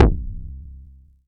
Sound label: kicks, drum machines, FutureRetro FR777